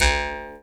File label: D JAW, Primitive Instruments